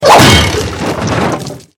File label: sigil slam explosion; billy ed edd n eddy sfx